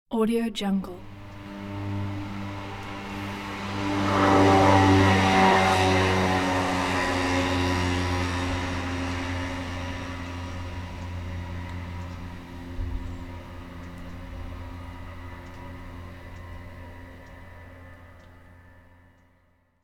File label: scooter slow drive by